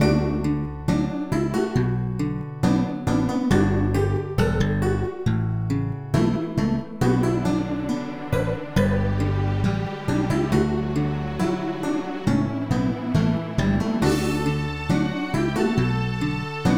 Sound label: Wind Music - A Sad Village